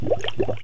water button

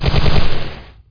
fling

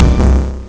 ORCH; Graliens; PC Gamer 47

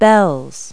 BELLS; Multi-Lingual Talking Picture Dictionary

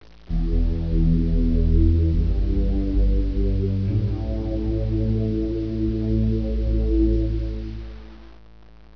low tone

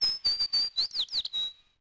day bird; DAoC; Toa

